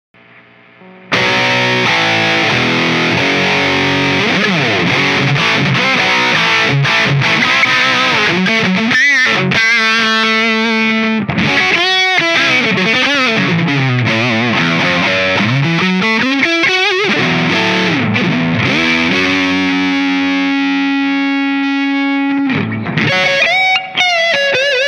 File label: POD XT crunch